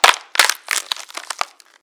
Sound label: Bone Break; Lucius III